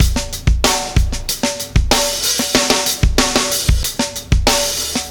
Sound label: Beat Loops; Zero-G - Monster Beats